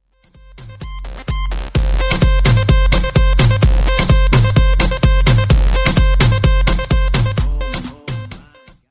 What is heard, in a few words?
amazing dance ring ringtone